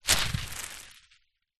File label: household baking grease proof paper unroll roll out on ground